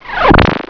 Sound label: Gold disappears; Bedlam 2 1.1.1; Bedlam Sounds